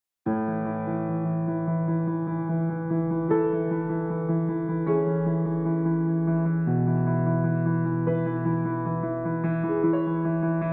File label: ocean, piano solo